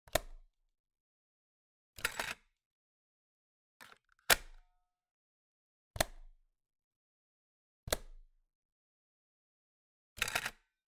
Tape Cassette Machine Marantz Pmd 222 Insert Eject